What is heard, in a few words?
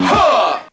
huh scratch, Voice; ooh ahh